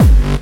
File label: Kick n Bass